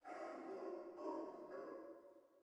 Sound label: bark